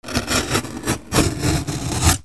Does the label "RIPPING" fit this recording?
Yes